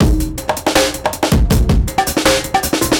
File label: FRACTURE BREAK